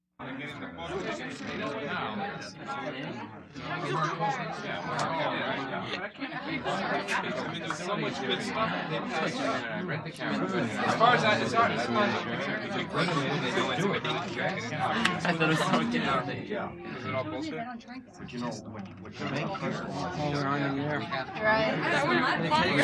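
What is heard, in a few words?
Party Crowd Medium 20 People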